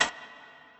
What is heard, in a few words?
tock; SunshineAssets